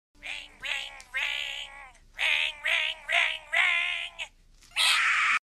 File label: Ring